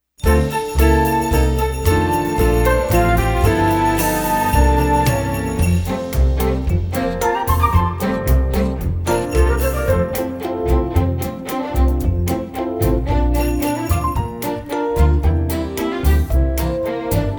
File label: Jingle Bells Instr